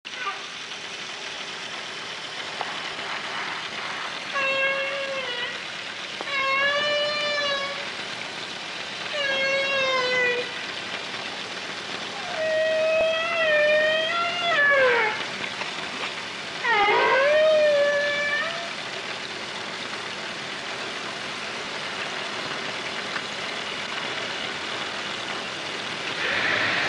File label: north right